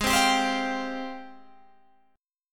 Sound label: G sharp Major 13th, Guitar, Standard; JGuitar